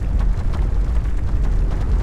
Lava Rumble Mid, ambience

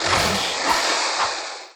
POWERUP; CROOK; Mob

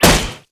Axe Metal, Damage

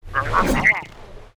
WAR ATTACK

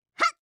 FEMALE attack

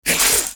damage

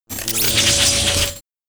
SWORD WAR ATTACK; Mob

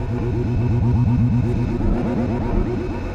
Mining; Weapon

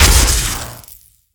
Plasmagun Metal, Damage

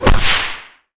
Arrow Skin, Damage

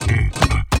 STONE KNIGHT WAR WALK

